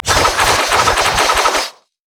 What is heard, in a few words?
heartseeker spear; pantheon